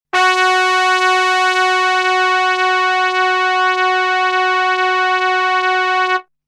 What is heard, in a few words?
Bright Trumpets; synth patches Korg Prologue; Dystopia